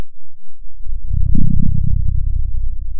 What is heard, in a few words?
pop, Sound of a dinosaur